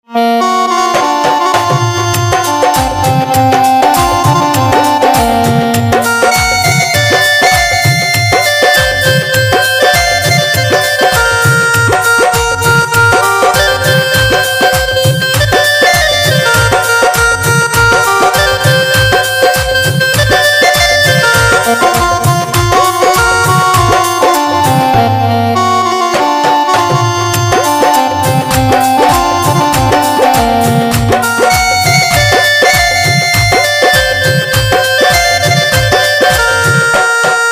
Saxophone

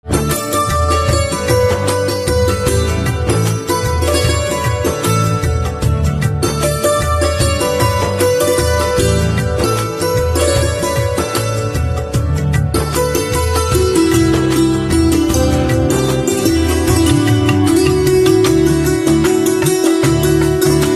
Santoor, instrumental ringtones

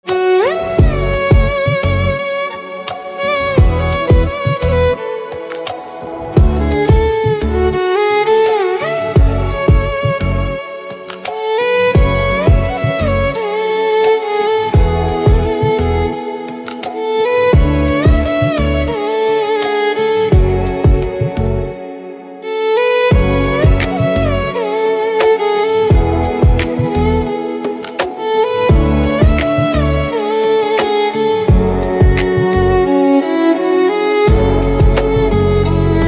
Violin

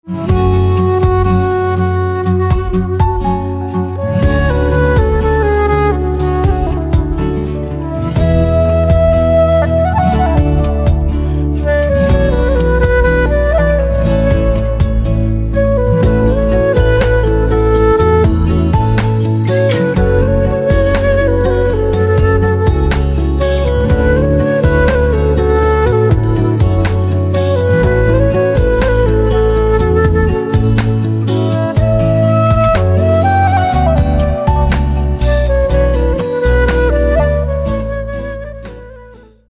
Flute

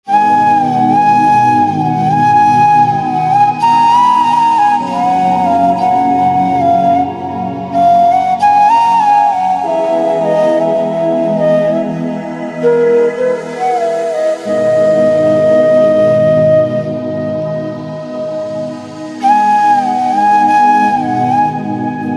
Flute Slow; instrumental ringtones

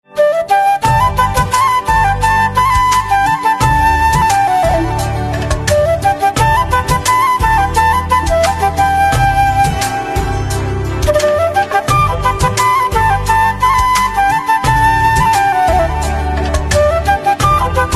Flute

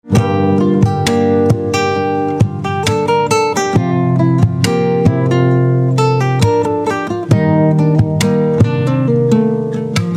Guitar

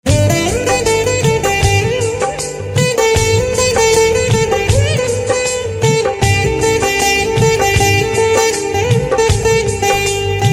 Veena

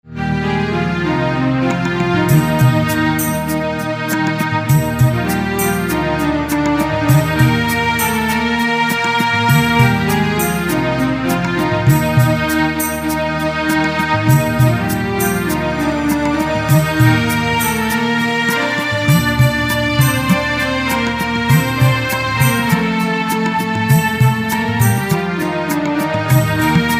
Synth

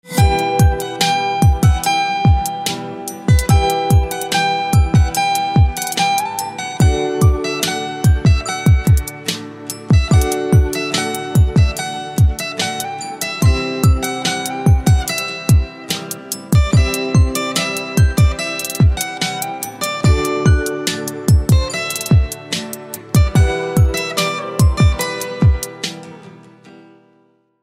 Guitar

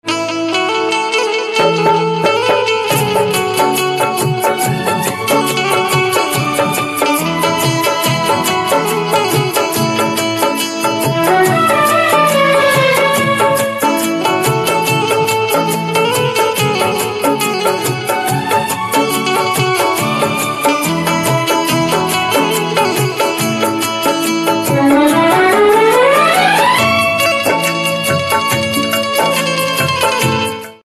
Banjo

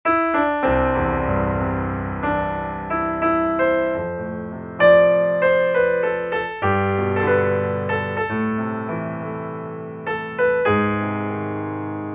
Piano Silent

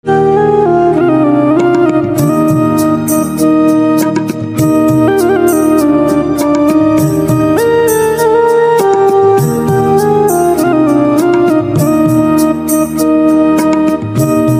Organ

